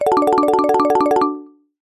multimedia game sound slot machine win or spin mallet tone